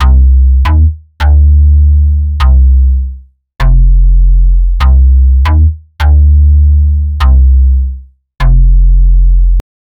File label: hard melody with sub bass, electronic